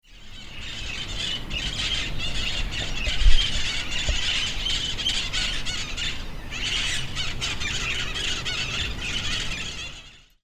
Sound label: Psittacara finschi, Cantos; exhibicion jardin; conservacion naturaleza